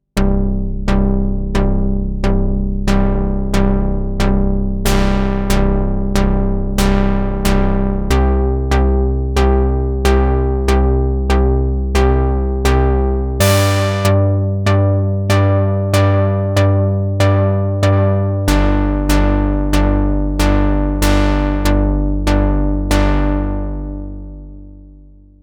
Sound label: NOISE BURST 7TH Moog Model 15 iOS